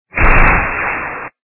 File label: lich attack middle